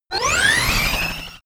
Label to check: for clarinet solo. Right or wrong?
Wrong